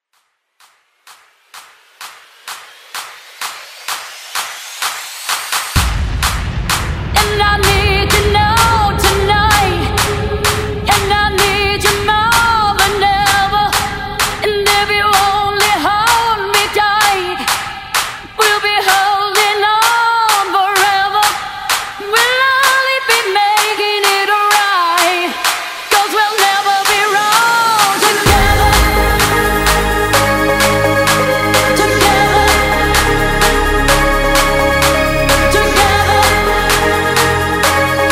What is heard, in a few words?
Clap A Pella Starter